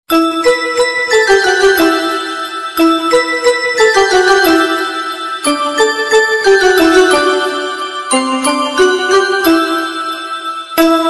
morning flute alarm ringtone, alarm set ringtone, flute ringtone